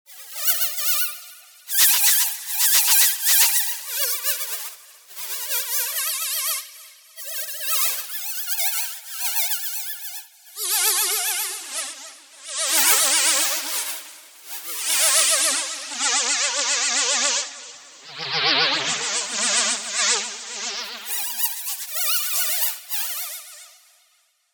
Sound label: Spooky Synth